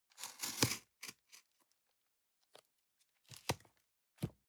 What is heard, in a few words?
cardboard box cut and open; objekte